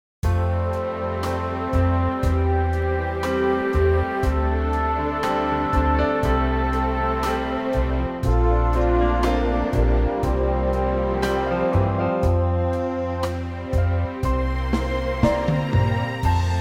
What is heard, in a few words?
orchestral F; vocal range - C to D (optional F top note)